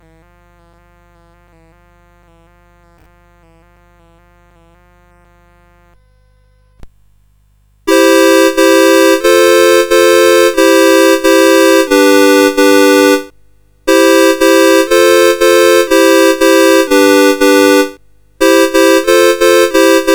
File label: Chord No1 Classic; CSG8580R5